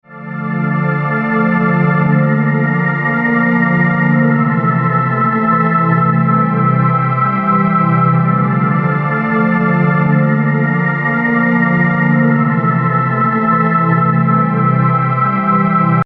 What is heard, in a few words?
Preset Angel Choir